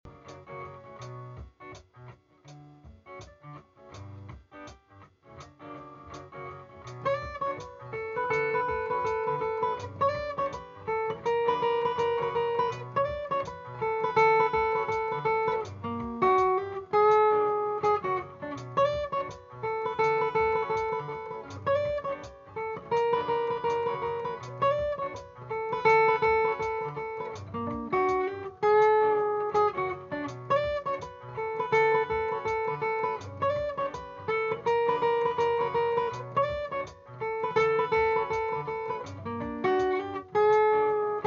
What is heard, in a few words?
Guit solo just harmony